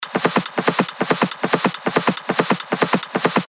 140 BPM, techno loops; Corput; revealed techno top loops vol 8

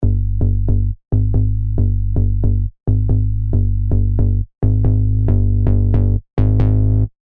Sub; Sylenth1; alonso 19 year anniversary pack

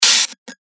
FX Hat, cymbals; revealed cymbals vol 1

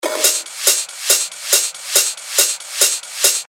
140 BPM, techno loops; Maximator; Revealed Techno Top Loops Vol. 9

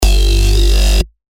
G, bass shots; Lemur; revealed bass shots vol 3

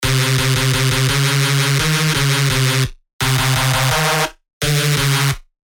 Synth, reese; Saunder; revealed serum 2 reese genesis

